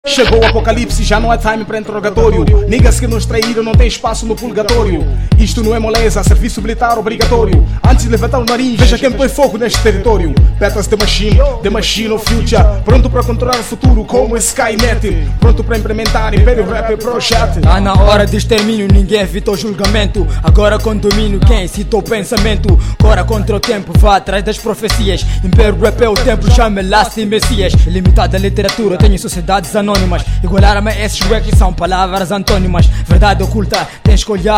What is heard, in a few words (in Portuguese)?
imperio rap